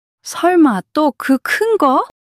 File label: surprise